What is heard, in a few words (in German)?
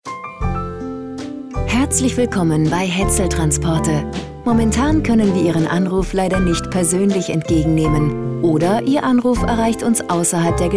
Telefonansage Bar Musik